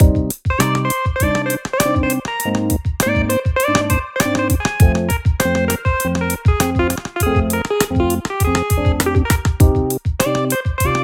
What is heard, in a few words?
Complaining Beat